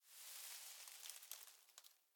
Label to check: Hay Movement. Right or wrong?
Right